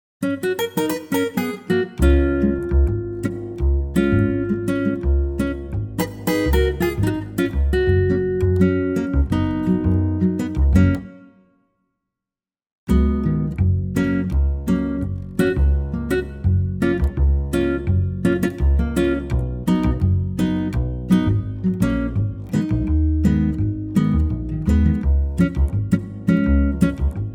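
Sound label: acoustic guitar Gb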